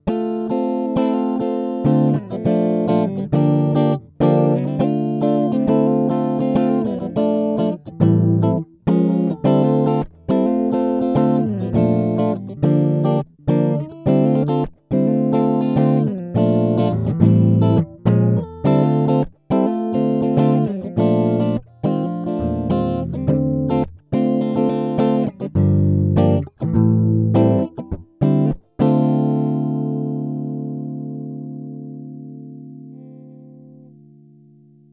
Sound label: Acoustic